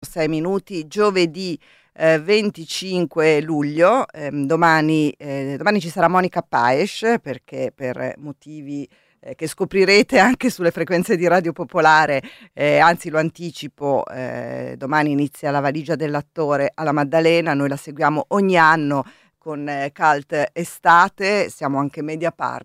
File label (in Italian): apertura musicale